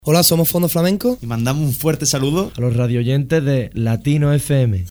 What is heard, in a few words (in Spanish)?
FONDO FLAMENCO